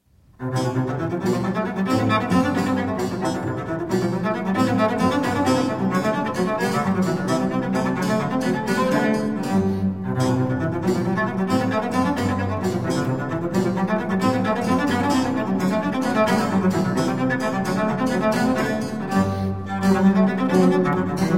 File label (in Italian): Sinfonia In Do Maggiore Allegro assai, per Violoncello e Basso Continuo; Francesco Paolo Scipriani; Vito Paternoster; Sinfonie and Sonate del settecento italiano per Violoncello e Basso Continuo